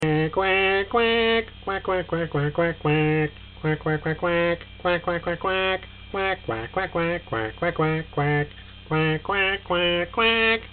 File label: quack quack